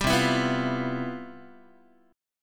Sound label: C Major 11th, Guitar, chord; CM11 strummed